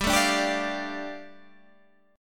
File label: G 7th Suspended 2nd, Guitar Half Step Down